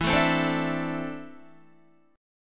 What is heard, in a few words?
E 7th Suspended 4th Sharp 5th, Guitar; E7sus4#5 strummed; JGuitar